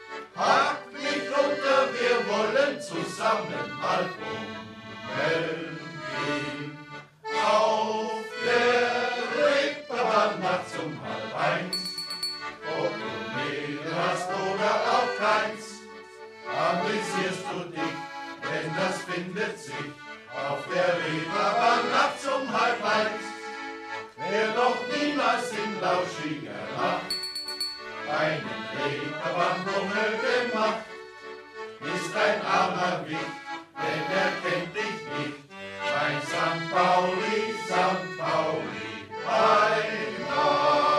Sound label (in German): Shanty Chor Walzerfolge